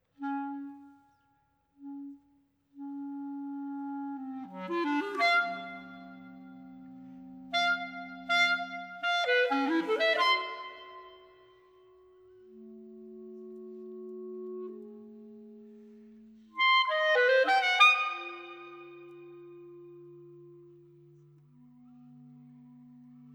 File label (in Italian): Trio Clarinetti